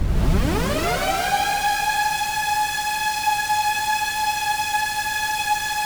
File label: AIR RAID RIP; Distored Reality